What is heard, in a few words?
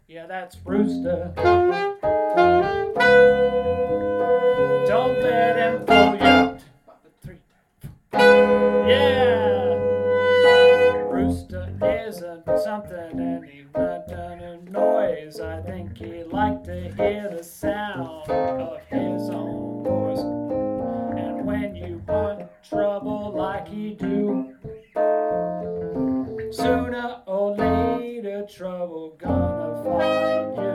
Hornline; Rooster